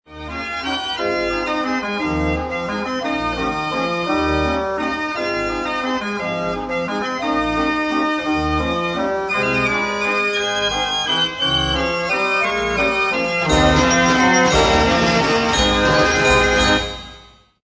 Grand Gavioli Valse Blue

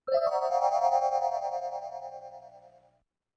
Music Drama Sparkling Idea Twinkling Accent; Electa Quinney

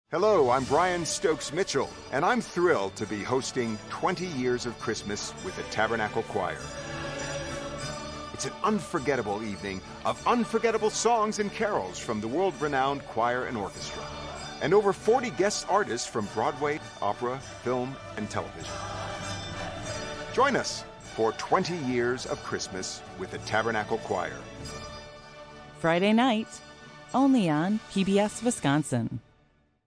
CHOIR XMAS; TABERNACLE CHOIR

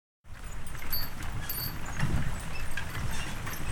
Horse Drawn Wagon; Electa Quinney

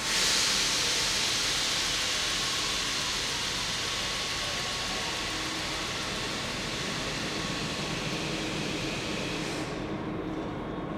Train, Station, Light, Air Blast; Poetry Project

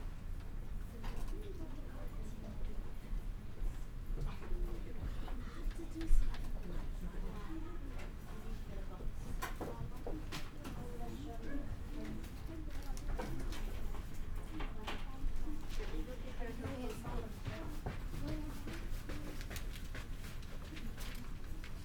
Classroom, Grade 4, Subtle; Universal SFX

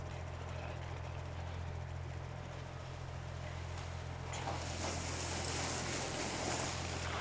stopping; EAU CLAIRE HTS; THOMAS NAUNAS

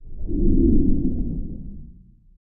Swipes

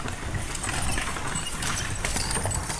Horse Drawn Wagon By; Electa Quinney

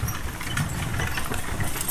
Horse Drawn Wagon; Electa Quinney

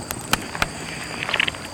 Fire Campfire Small; Electa Quinney

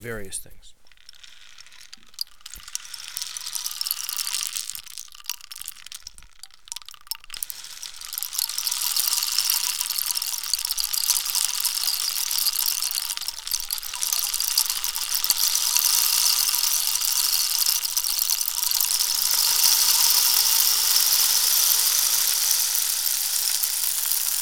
African Rain Stick Pou; Universal SFX

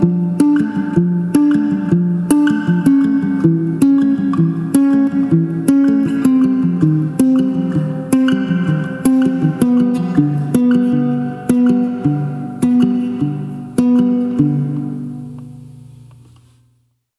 Arpeggio Bari; Poetry Project

